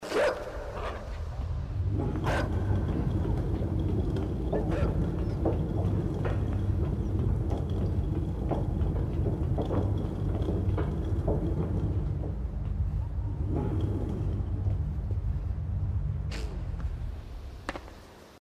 hut; inside

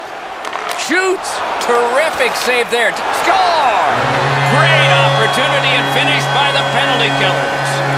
Reaktion Tor Heimmannschaft Horn; national hockey league 2019

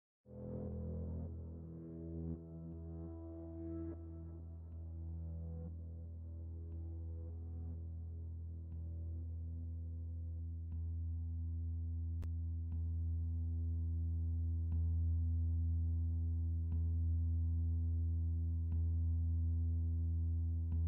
angespannt musik getting beat up; Life is Strange 2; gamesoundopedia

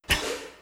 menu mausklick; magic 2014

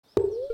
Axt Fehlschlag; stardew valley

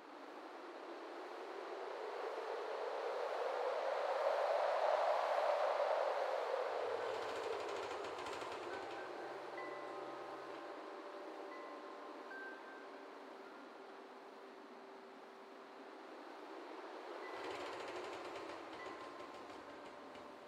verlassene gemeinde musik; Stardew Valley

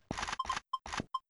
spieler nimmt munition; jazz jackrabbit 2

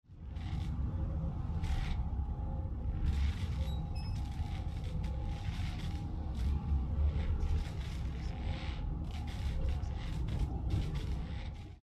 schiff ambietsound; divinity original sin ii

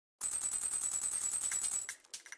geld anfang; Stardew Valley

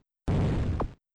Schuss auf Brick; jazz jackrabbit 2

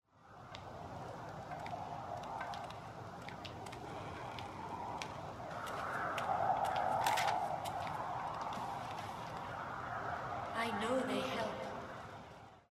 hoehle ambientsound; divinity original sin ii

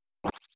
sichel; harvest moon

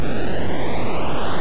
aufladen

